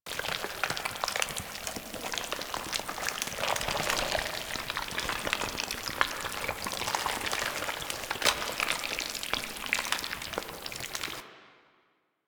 Vendor Loop; Ambience; Props; Infested Hub; Warframe; Infested Eris

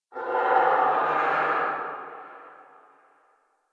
Tree Distant Critters Angry, Ambience

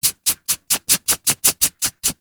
foley idle scratch; Enemies; Warframe; Catbrow

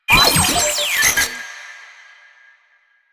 Sniper Drone Vocal; Warframe; Lasrian; Lasrian Drones

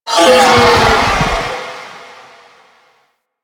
Sentient Vocal Death, Enemies; Warframe; Sentients; Ortholyst

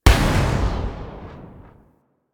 Amp Barrel Tail, Weapons; Warframe; Operators; Amplifiers; Amp Set 2

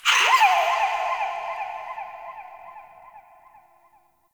Hallow Spirit Vocal Death; Halloween

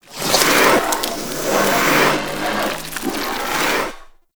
Inf Arm Cannon Charge Up; Warframe; Weapons; Infested

